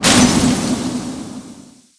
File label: Frost Nova State End; warcraft3